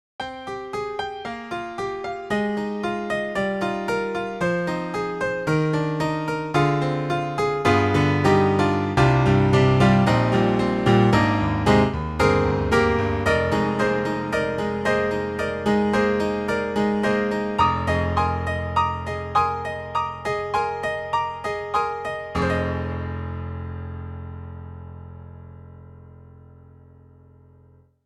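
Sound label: Yamaha grand; piano; IK Multimedia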